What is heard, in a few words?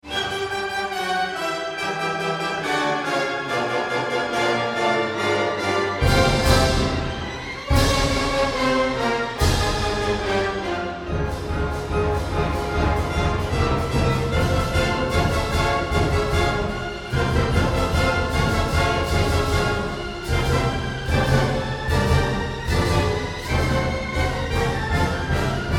orch samples mix